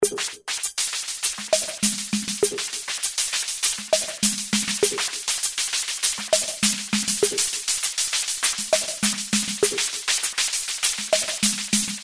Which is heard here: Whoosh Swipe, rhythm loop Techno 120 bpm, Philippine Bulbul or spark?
rhythm loop Techno 120 bpm